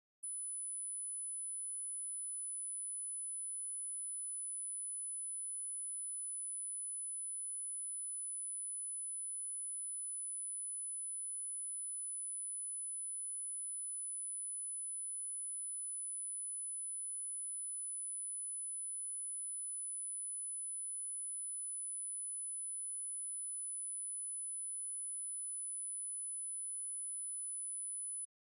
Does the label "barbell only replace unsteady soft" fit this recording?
No